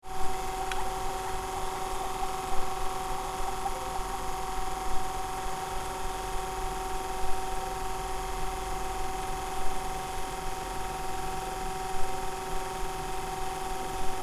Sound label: Cassette deck player static noise